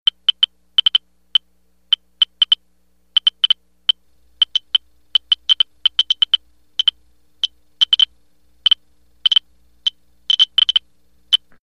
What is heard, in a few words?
Geiger Counter Vari; hospital